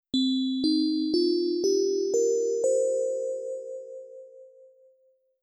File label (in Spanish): Do Pentatonica menor